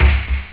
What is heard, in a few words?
rollchen, drums snare; OctaMED SoundStudio V1